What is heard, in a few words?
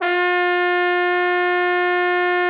TROMBONE, SECTION LOOP; Best Service; Killer Horns